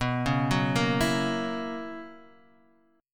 B Major 7th Suspended 2nd Suspended 4th B down Guitar Half Step Down